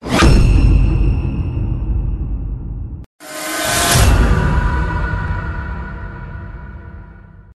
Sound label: parry and visceral